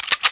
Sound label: PRELOAD; outlaws